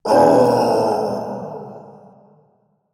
Falling; Grover; Paladins

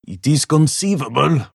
move pain; Pangolin

